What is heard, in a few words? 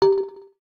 electric, keytone